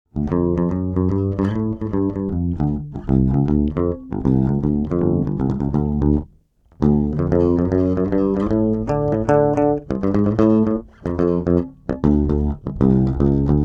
Chorus mid Pick

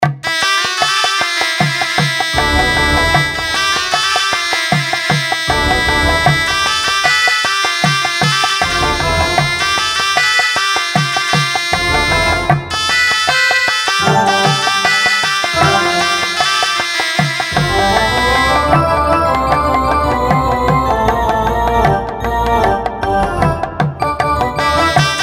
Ethnic Phrase

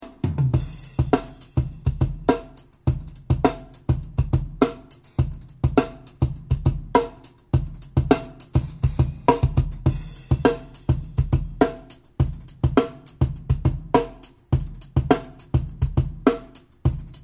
Drums

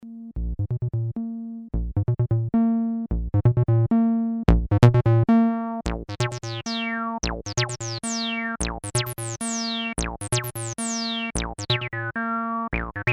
RE-303 Accent Slide Test; Din Sync

